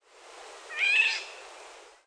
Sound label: Oriolus oriolus